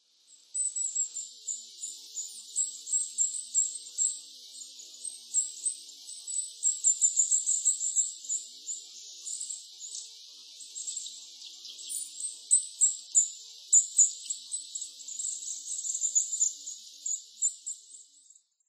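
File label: Eurasian Nuthatch, nasu; koe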